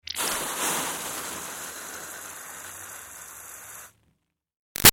Sizzle Iron Burning Water Fizz; Hisses; Objects; Big Room Sound

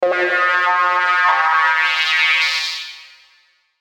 Logo Scary Synth; Blastwave; Sonic Logos

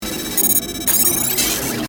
Scanner Ray Scan, Scifi; Science, Fiction; Tech; Sound Effects:Science

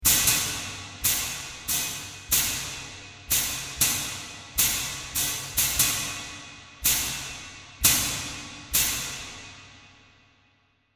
Sword Fight; Sword Sound Effects; fantasy sound effect

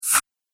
Ghost Whoosh

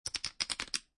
Poker Chips Stacking

